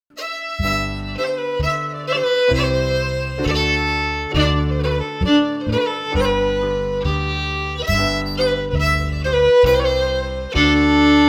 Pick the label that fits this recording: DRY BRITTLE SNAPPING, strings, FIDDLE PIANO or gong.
FIDDLE PIANO